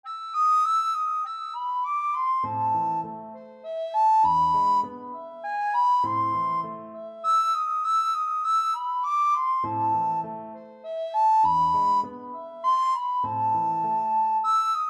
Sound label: recorder